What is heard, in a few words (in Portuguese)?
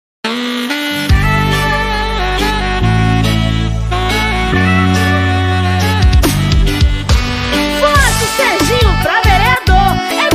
jingles politicos parodia